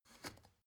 step ladder wood scrape single

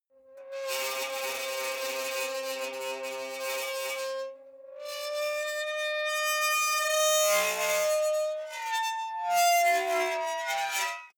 metal squeal sequence

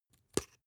spice jar small open slow; industrie wirtschaft; gastronomie

